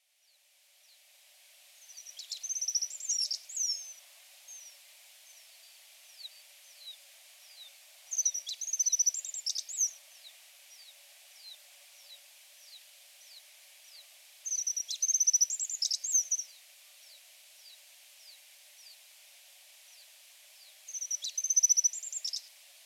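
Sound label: Alpine Accentor; iwahibari; norikura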